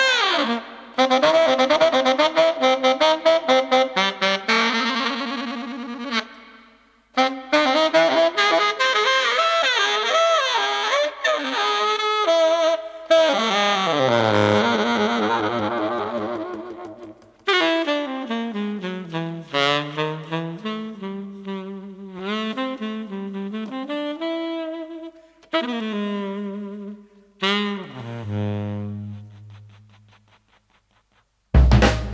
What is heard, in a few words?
Gentle Forest Jazz